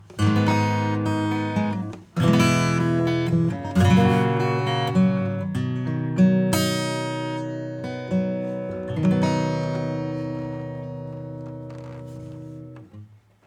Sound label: Acoustic